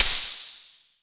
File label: WHITE N